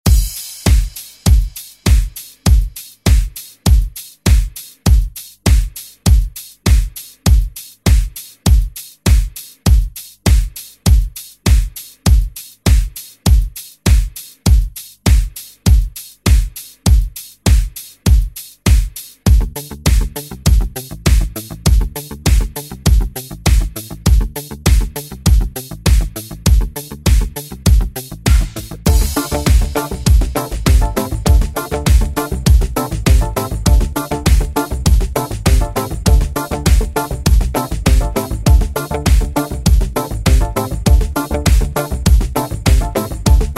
ReDrum; DJ Mhark

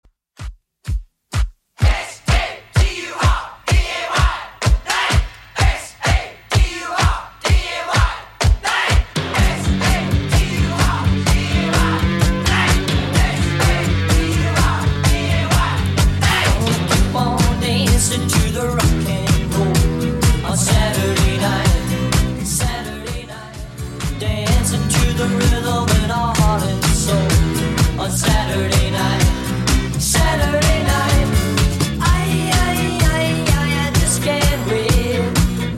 70s Redrum 127bpm

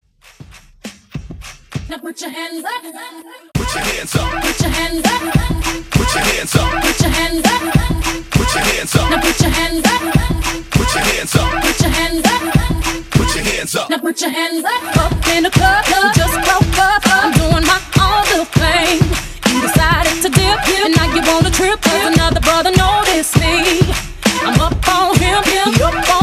Breakz, 100 BPM

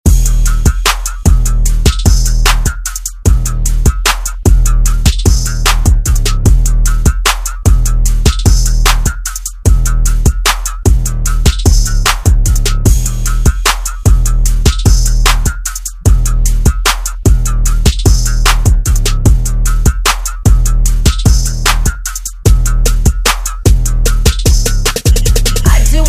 Kpop Redrum

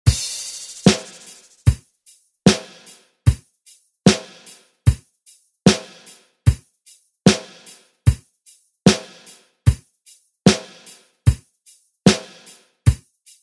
80s Re Drum

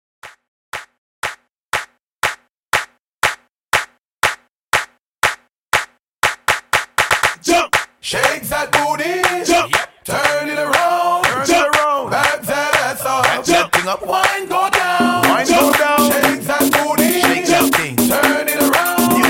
Party Clap Intros 120 Bpm

